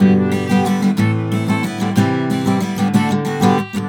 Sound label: GTR6, Celtic Flavours; USB Soundscan vol.42; AKAI